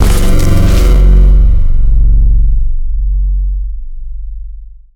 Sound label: Player Death; Game rip; MiSide